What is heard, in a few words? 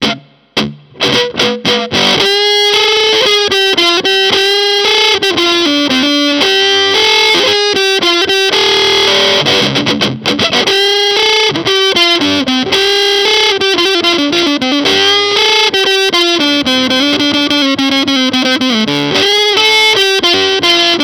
Authentic Fuzz Face; Dunlop